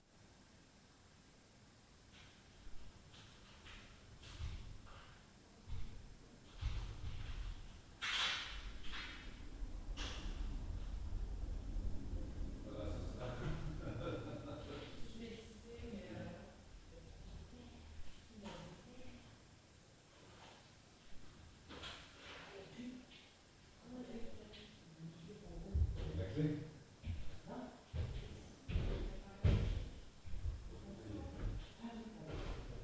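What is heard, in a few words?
SPEAKERS + DOOR + LF UPSTAIRS, Night Noise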